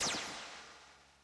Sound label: shot laser